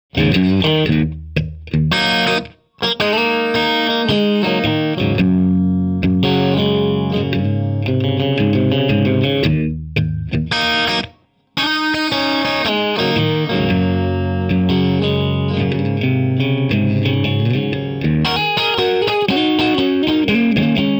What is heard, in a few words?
Electric Guitar Isolated